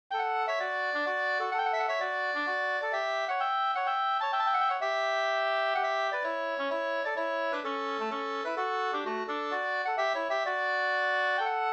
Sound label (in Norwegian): pols; Leken hinnes Karolina Viklund e Olav Nyhus e Sven Nyhus no 2st